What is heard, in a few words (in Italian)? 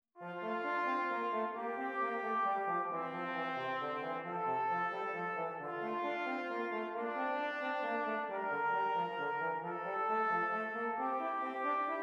Esercizi di contrappunto a due voci; Dritte Gattung; Ronge pagina 65; Correzioni